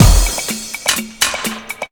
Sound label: drumloops 125; PS-28 Vocal House Samples; clonk4clonk